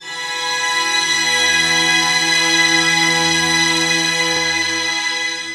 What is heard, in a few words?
strings; Columns; Dynamic DWM